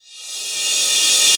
Casio CTK-611 Reverse Cymbal

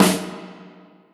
Snare Drum

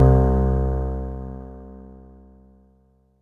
Kawai K1r Piano C3

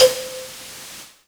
Alesis S4 Plus Breath Noiz C5

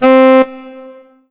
Korg 01W Tenor Sax C4

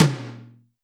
Roland R-8 Power Tom

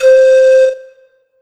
Pan Flute C5; Korg NS5R

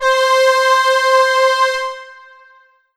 Roland PN-D10 Strings Ensemble C5

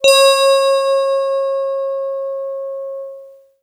Yamaha DX7 Bell Flute C5

